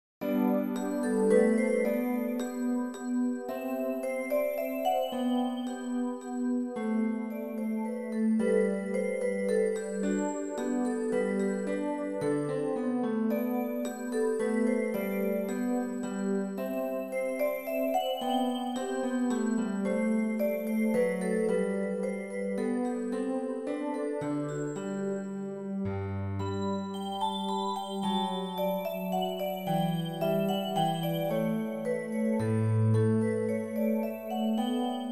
menuet

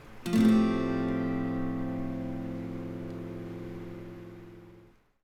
Fadd9, guitar

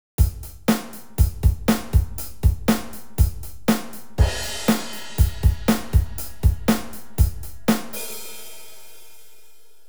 drum

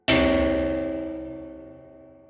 gone; SE

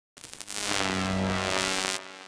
noise

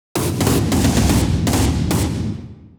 gun

